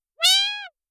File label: cat voice